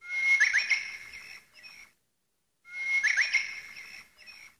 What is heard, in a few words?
bird ringtone, samsung sgh t100